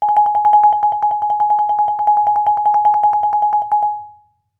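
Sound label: Marimba roll fortissimo Ab2; MIS Pitches - 2014; Percussion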